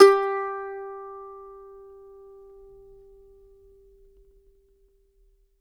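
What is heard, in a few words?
G4, Mandolin; Sampleheads; Whole Lotta Country 1 & 2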